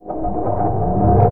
Engine charge

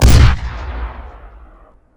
explosion

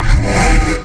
power up; atmospheric engine

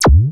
Laser

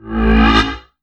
power up; script engine

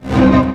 power up; powerups

